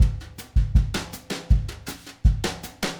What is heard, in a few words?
Drums, Jazz